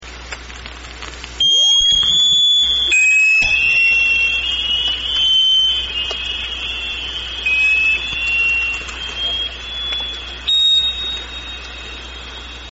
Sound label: sqs53c sonar, DDG